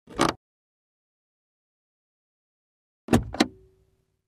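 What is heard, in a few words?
opening closing car glovebox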